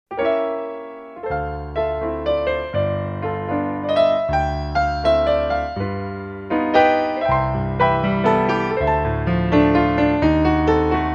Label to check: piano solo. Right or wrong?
Right